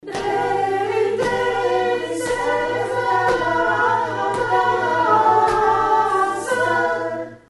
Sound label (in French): tutti; aksam; mes 13-23